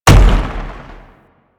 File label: Biped Step, Enemies; Warframe; Man In The Wall